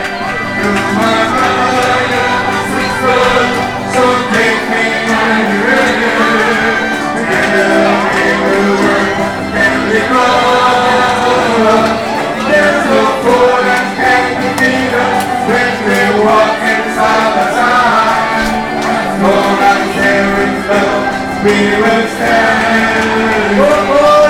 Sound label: church gospel; best service Blue Box